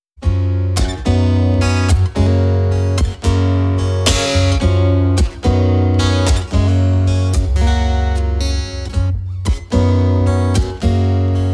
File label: Acoustic Version